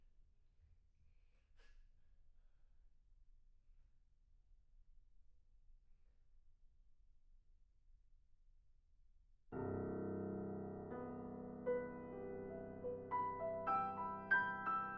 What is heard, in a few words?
PIANO